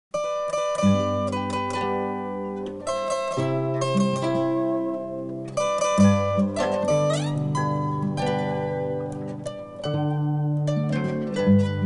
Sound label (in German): Volksmusik